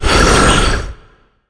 energy vuln

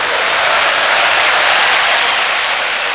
CHEER; bugs

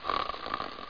snore; kidsplay

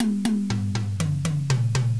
Drum Song; Mythos

